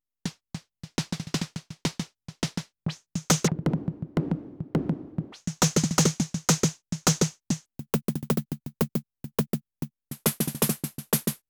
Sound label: Fire Instruments